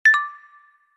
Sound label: Short Cute Tone